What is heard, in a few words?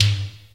90s hi tom sample g key; tuned drums g key